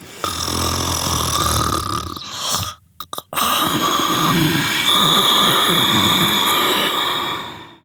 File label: Snores, Mother, Vocalizations; Warframe; Deimos